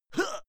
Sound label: male fight attack hit; schreie; menschen; soundpool